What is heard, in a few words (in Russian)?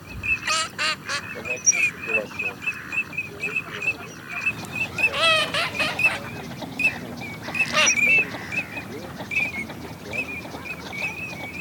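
Чирок-свистунок Alzavola, манок; plurifon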